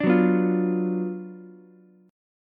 F sharp Major 7th Suspended 4th Sharp 5th, F sharp, down, Ukulele; F#M7sus4#5 strummed